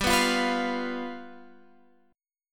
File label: G 7th G down Guitar Open F; G7 strummed